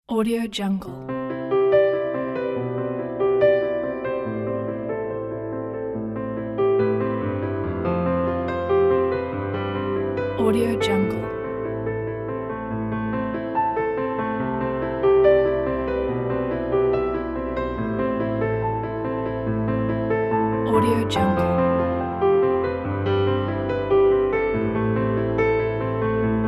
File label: piano and strings